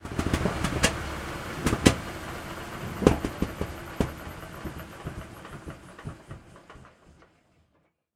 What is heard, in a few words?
exterior engine stop, engines tanks; War Thunder